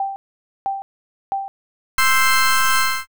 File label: countdown